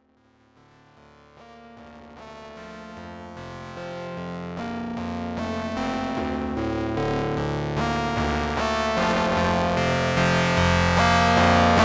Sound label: SID 6581 multi; SammichSID